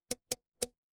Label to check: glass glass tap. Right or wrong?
Right